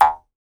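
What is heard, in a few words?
Jaw Harps, Pitched Percussion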